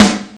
clear snare drum sound g sharp key, steel snare drum sound tuned to the G#; snare drum samples